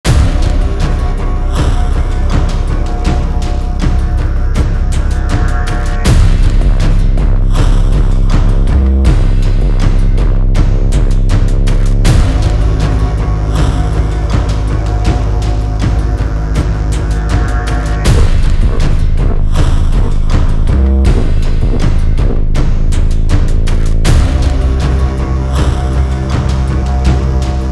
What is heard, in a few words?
Horror Imulsion; Horror Vol 4 Music Pack